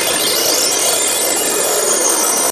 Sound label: hack spectre reprogram tones; dataknife; Titanfall 2